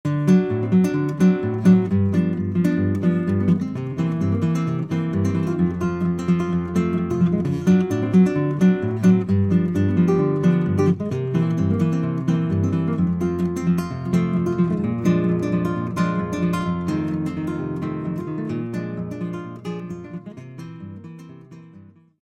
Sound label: solo